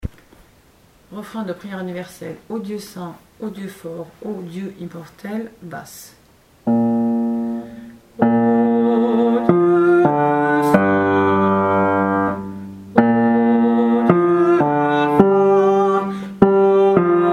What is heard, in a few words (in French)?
BASSE; Refrain de PU TOUSSAINRT Ô Dieu saint